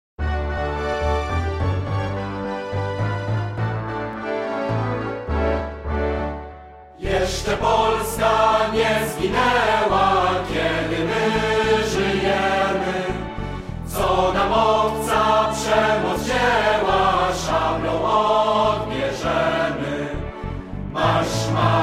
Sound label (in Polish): hymn polski; starsze przedszkolaki